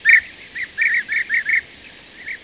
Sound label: call, bee-eater; merops apiaster